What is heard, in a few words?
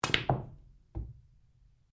Billiards Cue Ball Cushion Cushion, Home billiards table; Pool; Sports